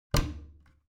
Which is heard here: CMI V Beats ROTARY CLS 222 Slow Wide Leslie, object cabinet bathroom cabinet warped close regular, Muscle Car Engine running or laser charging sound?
object cabinet bathroom cabinet warped close regular